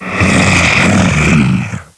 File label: Scrake Death